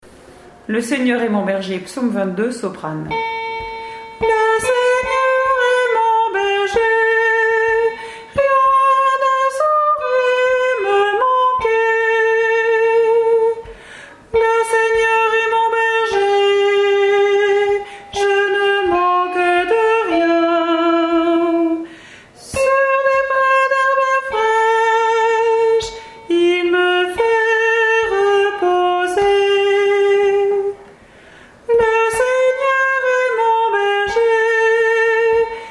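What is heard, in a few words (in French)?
Psaume Le Seigneur est mon berger Soprano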